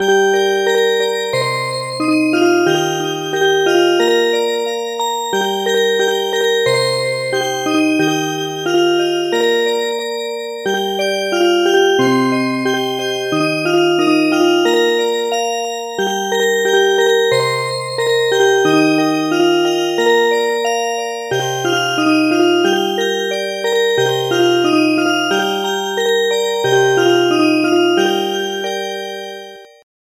lullaby Music Box; traditional; Thai

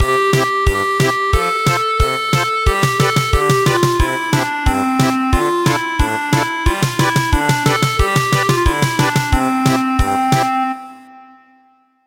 German Polka; Goodnight Song for Dobbin

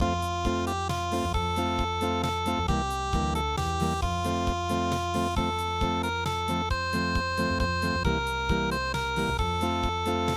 unpluged; Hush Bye Baby

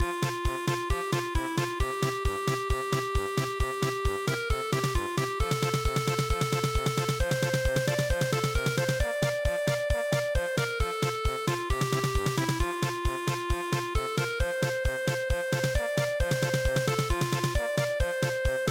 Nona manis siapa yang punya polka

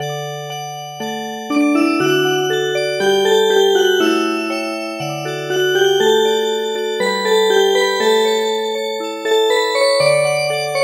Music Box; Lullaby

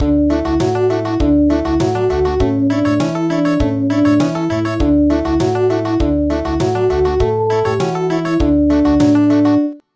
Country

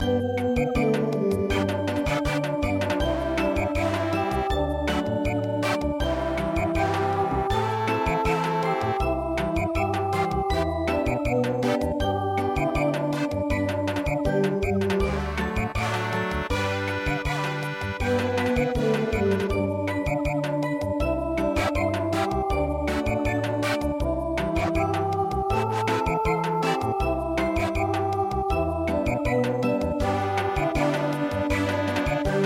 Samba; Magaii Song

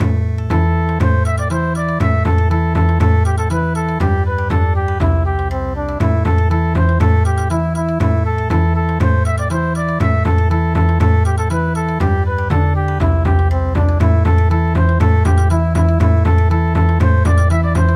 Bon Odori

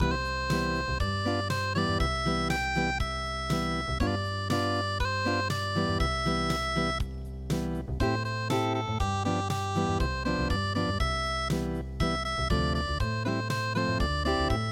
Oshogatsu unpluged

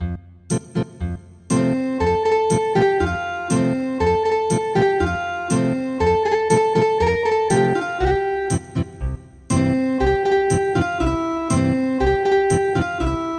Ethnic Guitar

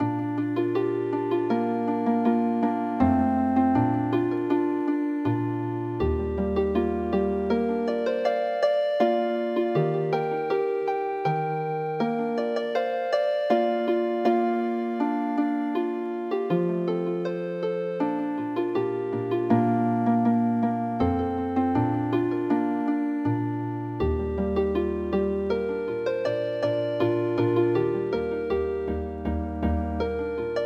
harp; canary

